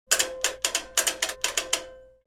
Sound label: Washing machine washer choosing program